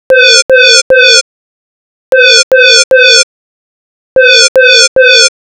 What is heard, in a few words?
chime